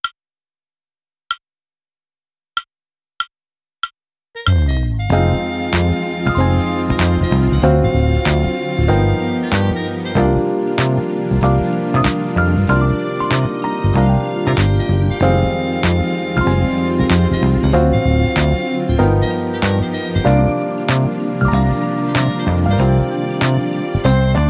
Funky Muted Jazz drumless

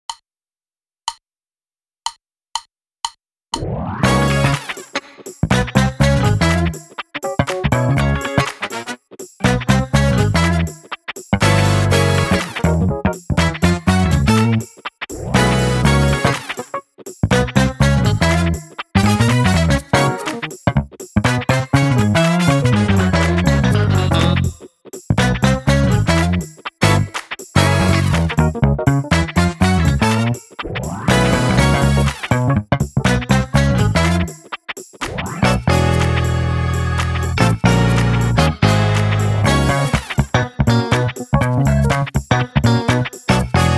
Hard Funk drumless

